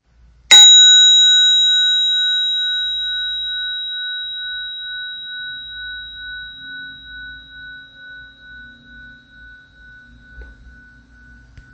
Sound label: gong